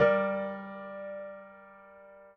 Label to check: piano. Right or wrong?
Right